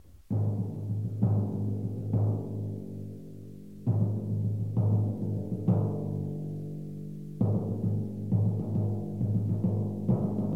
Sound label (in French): timbales; Berlioz